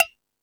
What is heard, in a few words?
Thundadome, E-MU Pro-Cussion; drum machine